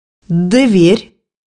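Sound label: door; bukvar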